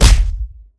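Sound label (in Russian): беспощадный скользящий удар плетью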